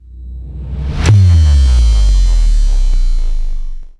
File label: Approach Bass Drop